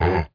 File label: Gorilla; PC Gamer IT CD 41; machines demo